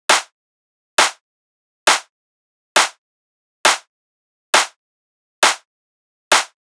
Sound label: Clap Loop 135, Techno